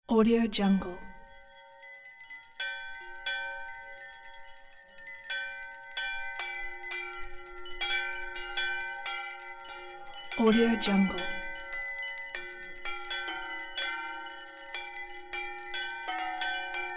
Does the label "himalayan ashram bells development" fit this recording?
Yes